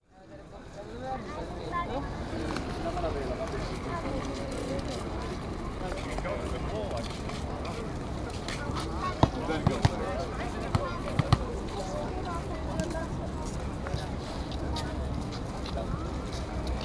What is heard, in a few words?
Beach Lane